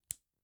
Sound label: Rivet, Clothes